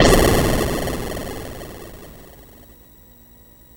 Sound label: C64 Filter Explosion